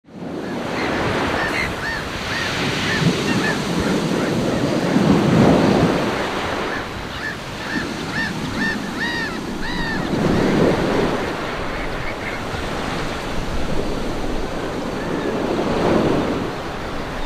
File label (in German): nordsee entspannung, northsea relaxation soothing sounds piano; crushing waves